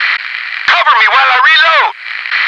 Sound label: noice